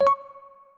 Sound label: countdown